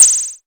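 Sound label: percussion, ARP Odyssey Drums; Polyend; Simulacrum Sounds; 1 Shots; Synths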